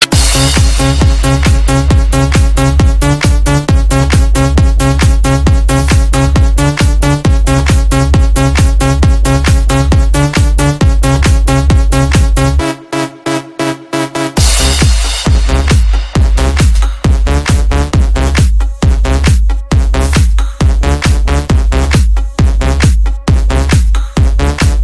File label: top electro ringtone